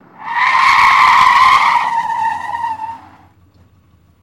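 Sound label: Cadillac Skid To Stop; Cadillac 1972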